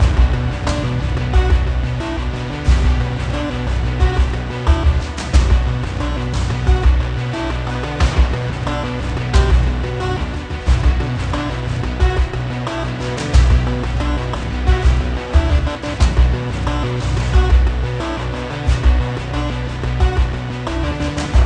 Industrial Combat; LAYER 6

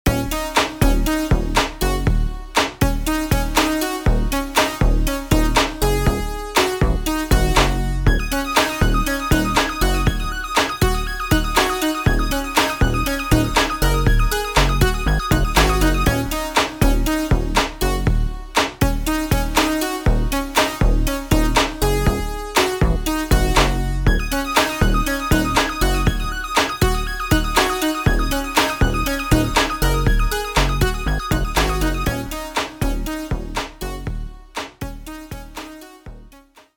Beat; Peggle Beat 11

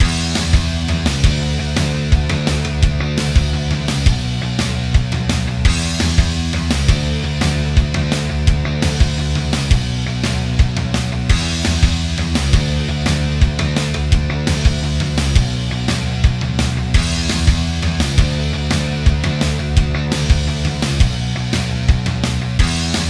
3d Music

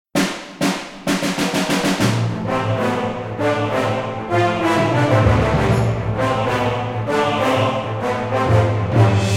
Orchestral Remix Intro; MafiaBoss

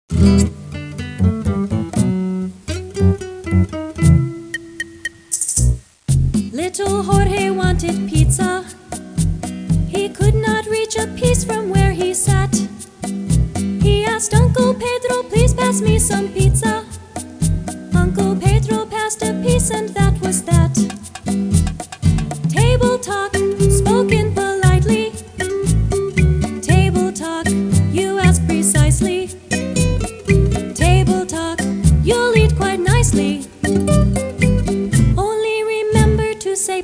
Songs; Disc Man; four Table Talk; Fisher Price Ready for School Kindergarten

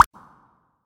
Bubble Pop

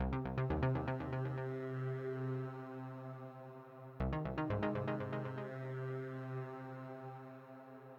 Menu Layer; Tron RUN/r

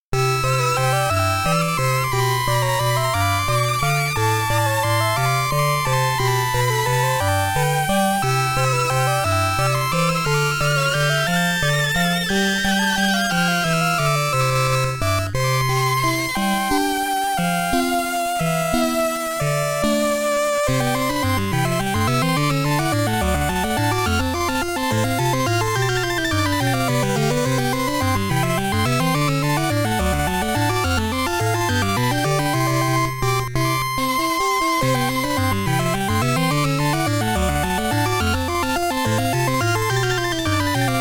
Magical Waltz; atelier lise alchemist of o ldor original sound track premium pack

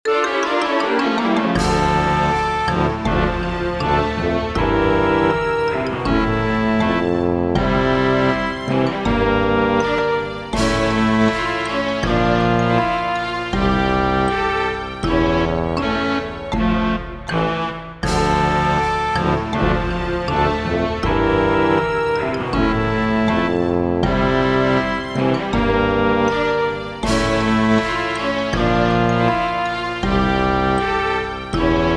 Defeat Fanfare Stinger; Little Alchemist Remastered

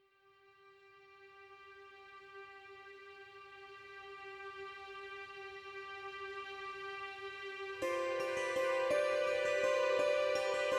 Music Roguelike Theme With fades; Cornerstone The Song of Tyrim

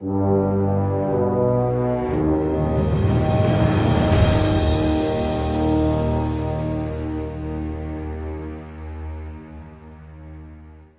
Troll Door Open

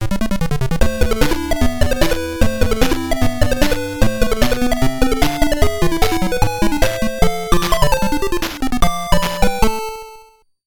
WORLD CLEAR (PSG Version); Hydefos

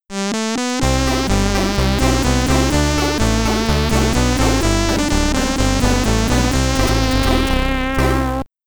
Ending SID Stereo; mission impossibubble commodore 64

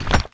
blocker close

